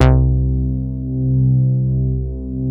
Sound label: C3, BASS; Q Up Arts; Dream Experience; BASS BANK